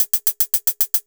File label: High Hat Closed